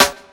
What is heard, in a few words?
dry snare sample D key; snare drum samples